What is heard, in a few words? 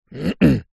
human man clear throat